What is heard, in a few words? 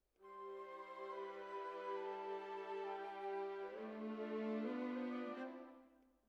Cellos